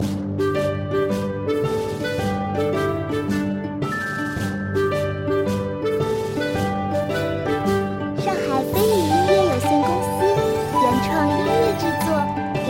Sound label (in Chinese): 日系Q版可爱 登录; 阿喵阿汪